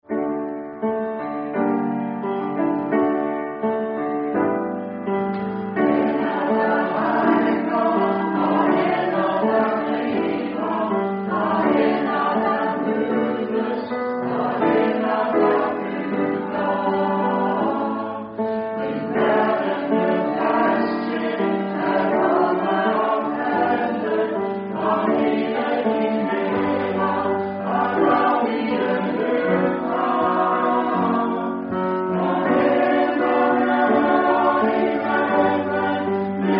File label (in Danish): stille mirakler alt tenor